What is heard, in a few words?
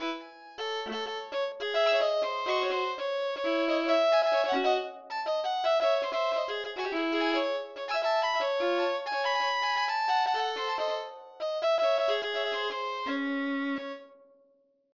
Converted MIDI Sonatas